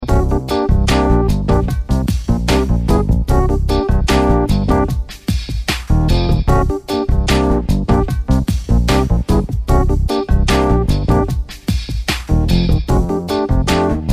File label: funk jazz